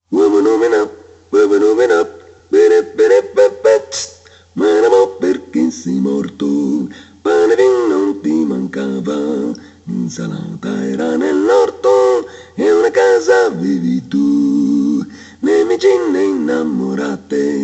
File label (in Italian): canto multifonico; Orchestra solista - Il lavoro vocale nel Teatro (DVD-ROM)